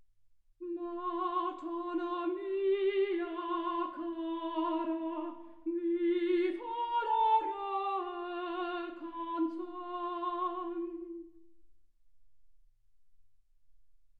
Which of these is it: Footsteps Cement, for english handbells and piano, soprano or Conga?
soprano